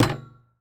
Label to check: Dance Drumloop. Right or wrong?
Wrong